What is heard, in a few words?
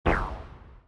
shoot